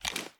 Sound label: ads up, reloads; gmod